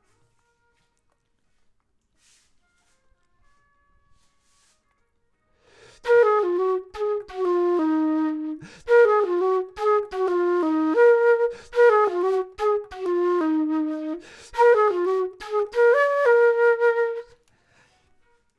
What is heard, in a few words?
flute; logic